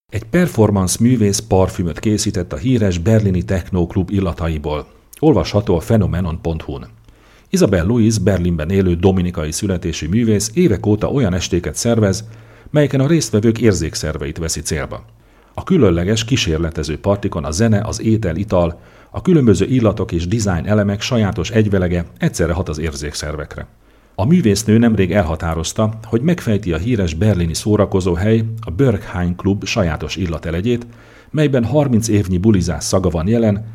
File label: techno club; parfum